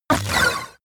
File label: sci-fi laser shot short